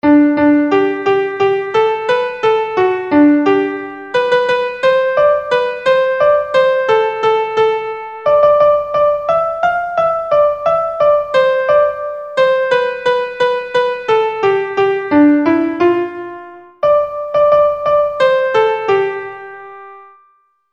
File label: row between boaters melody